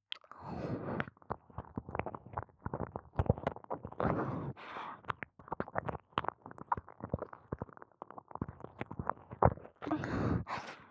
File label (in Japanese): 耳舐め（奥まで）; ポーラ（妹）